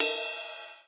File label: hard ride